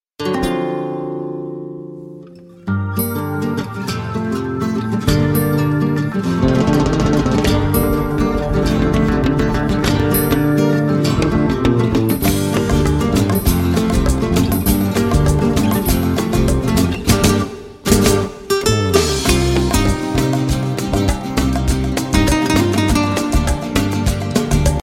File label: Time Of Love Guitar